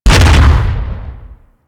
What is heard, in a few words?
Step Metal; Warframe; Teralyst; Ropalolyst; Steps